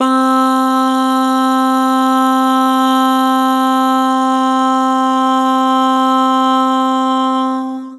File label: "Bah", Vocals; Harmor